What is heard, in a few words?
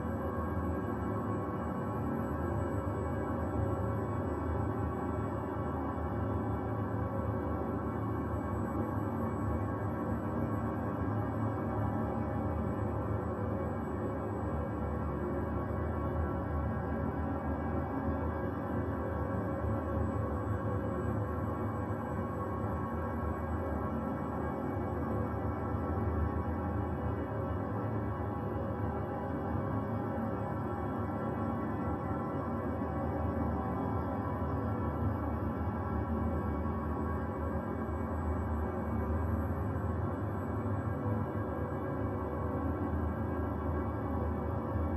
Drone rich dissonant rumble with overtones